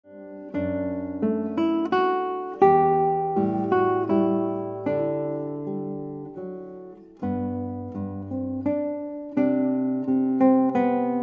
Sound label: Guitar